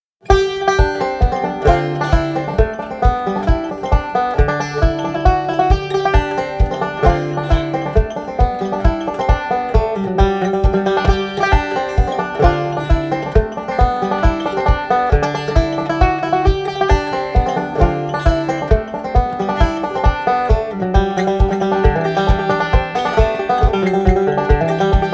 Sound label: polska, banjo; Vänkärin